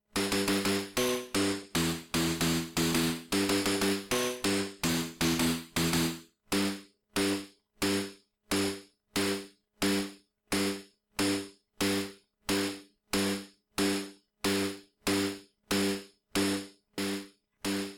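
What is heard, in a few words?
Gamelan, Synth; Synth Battle Royale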